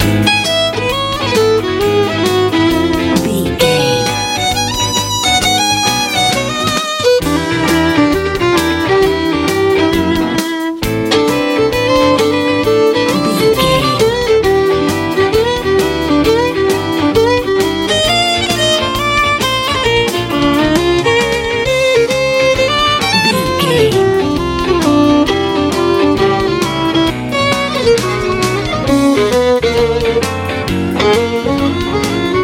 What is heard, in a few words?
complex blues